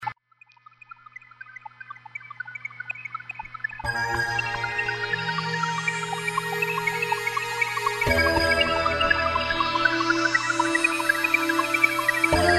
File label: embryonic cellowing the atmospheric sound